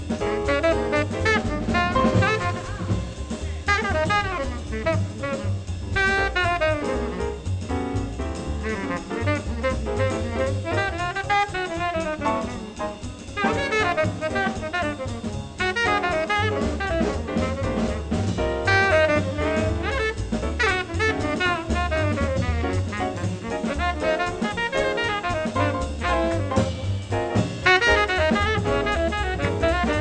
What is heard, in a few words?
jazz